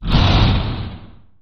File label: roar On